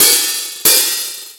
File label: Rock N Roll, Drum Machines